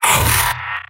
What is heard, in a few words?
soldier pain sharp; mvm